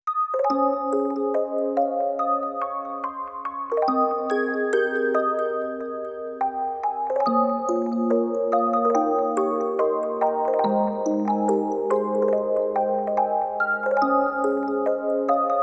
Orgel; Himawari no Kyoukai to Nagai Natsuyasumi; Sakura to Kotori

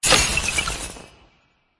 Click; Laddergift

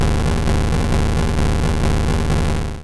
Fire Spin; sfx; attack moves; pokemon; gen 1; rby

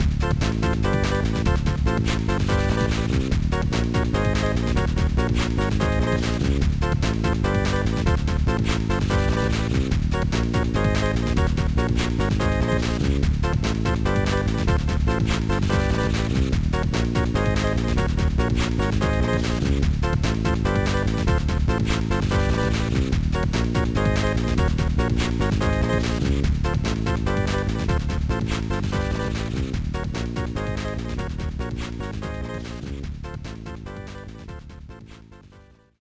BGM; Rocket Power: Zero Gravity Zone; GBA

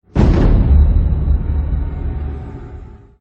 Jumpscare; don t be afraid the first toy

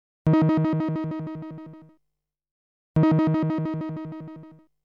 (Sound Effect) Pay a Small Sum, String Quartet

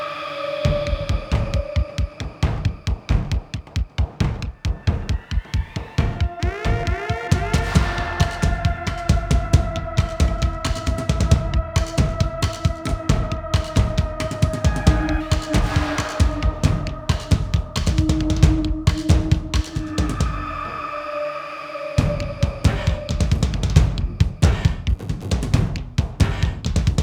perc loop; Nick2d; Dead Rising 3 Apocalypse Edition

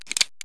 reload Revolver Chamber

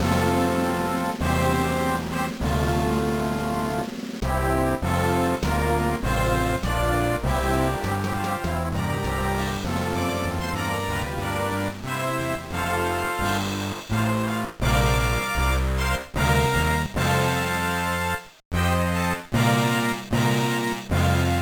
BGM

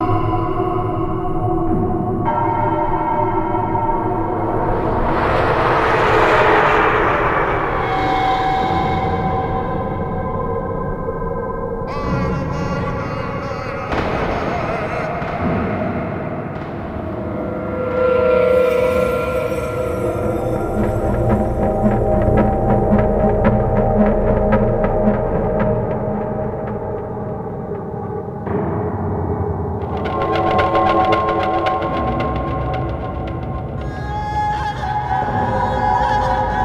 Freeway (ambience); Twisted Metal Black Online; PlayStation 2

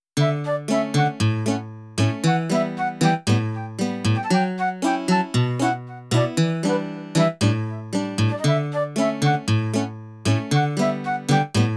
Street Corner Live Refreshing Bossa Nova-ish

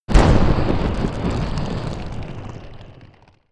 Medium Collision

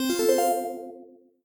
Panel Dressup Finish; Among Us

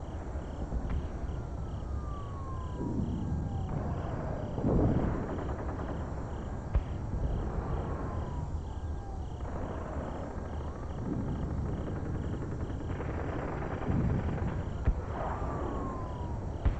Ambiance Expo; Iron Man 2

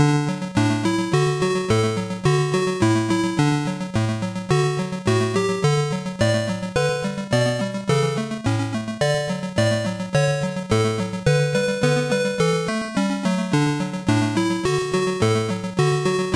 Taiko Performance Song

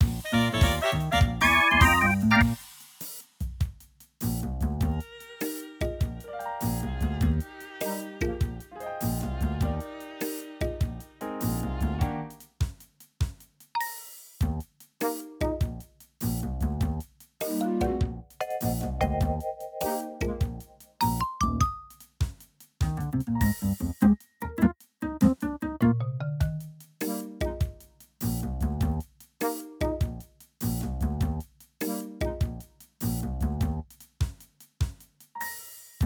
Dice BGM; LeftWin

